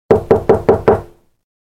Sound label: Door knock sound effect